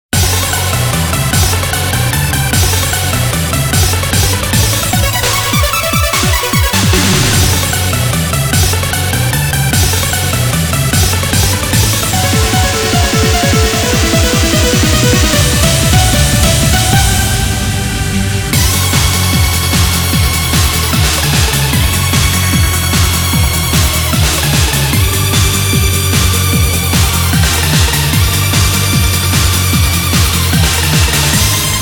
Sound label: Battle Photoelectron Noise Mix; SaGa Remix Best